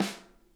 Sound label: SNARE, Interactive Drum Kits; Sonic Reality